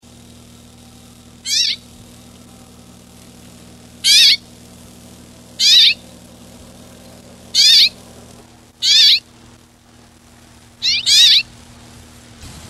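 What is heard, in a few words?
Carpodacus erythrinus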